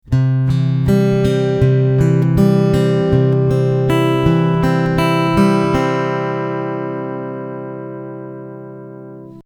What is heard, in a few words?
odd fingerpick, acoustic guitar